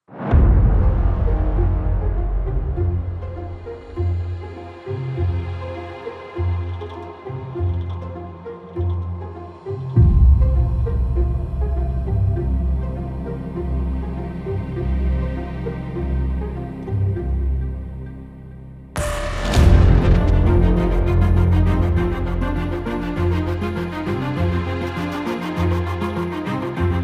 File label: Hyperion Strings Micro; Soundiron Hyperion Strings Micro